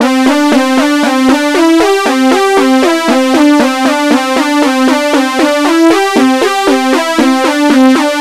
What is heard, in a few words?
synth